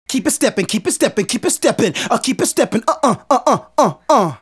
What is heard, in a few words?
Male Solo, Hip-Hop and RnB Vocals